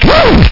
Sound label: scratch